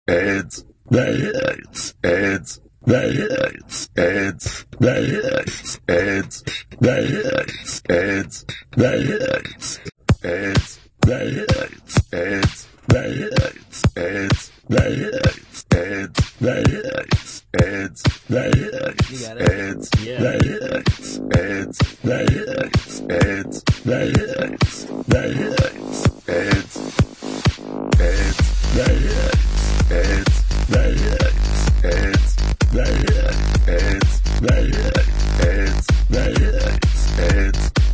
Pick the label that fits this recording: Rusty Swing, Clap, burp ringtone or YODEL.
burp ringtone